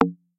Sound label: swipe no next item; VoiceView